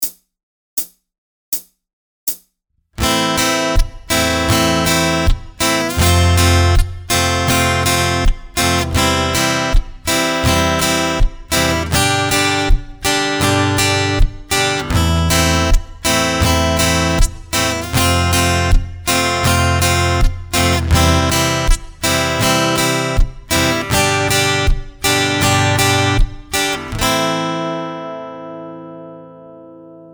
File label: The Basic Backbeat Percussive Groove SLOW; Ultimate guide to strumming book